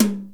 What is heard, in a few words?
Hi Tom, Zoom RT-234; 300 Drum Machines; Kit B